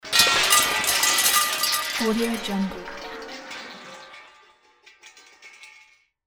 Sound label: wood metal and glass objects big crash